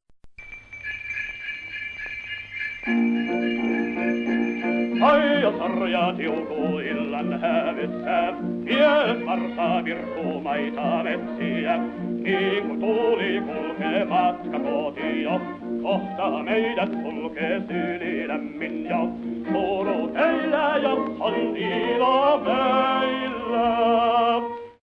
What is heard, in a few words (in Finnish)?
matkapolska